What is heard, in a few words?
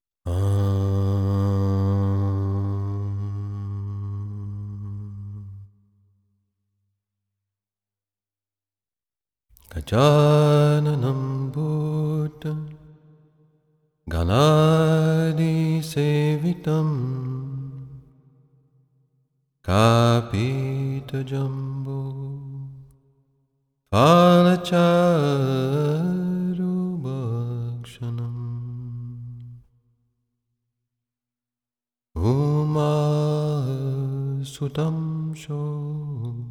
ganesha mantra as bhajan